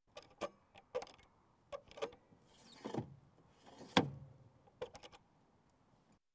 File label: Landrover Safari Diesel Windo; Farm Machinery; BBC Sound Effects Library